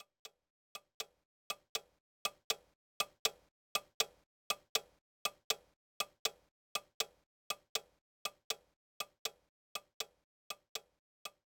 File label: clock; Perfect Kanji Keisan Master DS